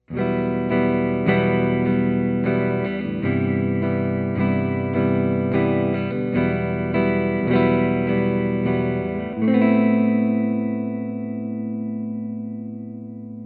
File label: humbucker clean, guitars; axxis